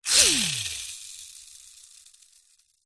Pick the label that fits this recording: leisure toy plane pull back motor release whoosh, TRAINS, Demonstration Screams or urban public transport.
leisure toy plane pull back motor release whoosh